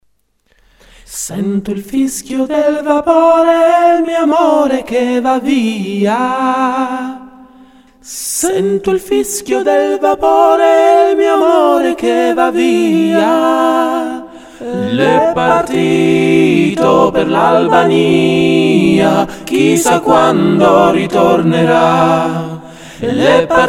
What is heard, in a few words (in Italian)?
4 voci